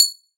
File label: Hit Song; CDSAE3 Lian Lian Kan